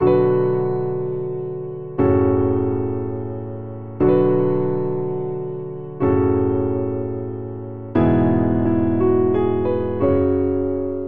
Progression Sombre Cinematic 3/4 Eb Major 90 bpm, Grand Piano; MIDI Clips; Ableton; Factory Packs